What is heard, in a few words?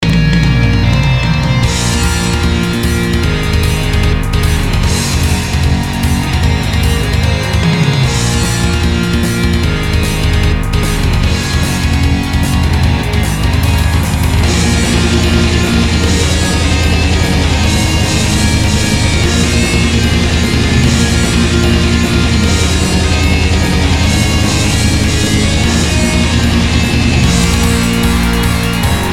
Metal